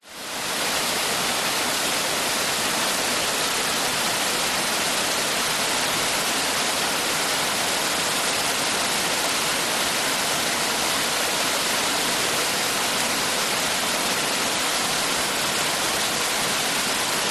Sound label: nature large waterfall